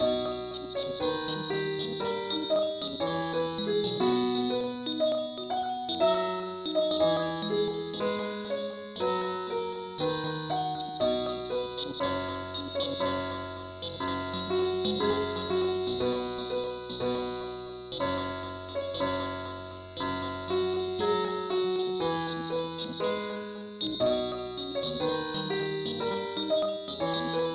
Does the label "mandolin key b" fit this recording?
Yes